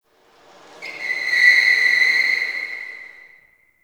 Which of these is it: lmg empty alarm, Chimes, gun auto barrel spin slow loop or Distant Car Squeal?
Distant Car Squeal